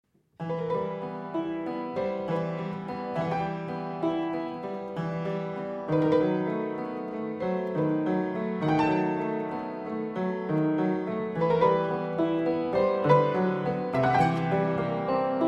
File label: grand piano adagio; haydn